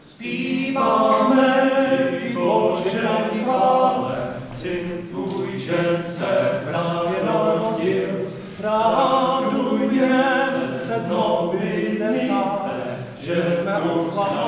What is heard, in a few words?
fanfare; H.Purcell; Adventní koncert staré hudby